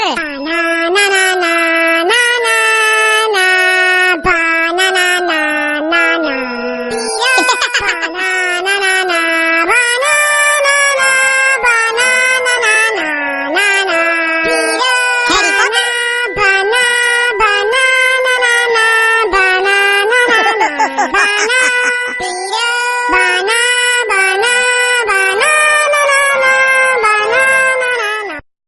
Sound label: sad music